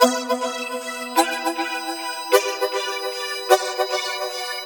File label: Synth Chiffin, Drum N Bass, Keys Pads; Sonic Foundry (Sony Creative Software); Knutrix Drum & Bass